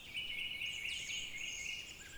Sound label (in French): 7x Cui, Oiseaux